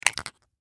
Flashlight Dump, Foley Flashlight Dump Batteries Into Hand Plastic; Flashlights; Blastwave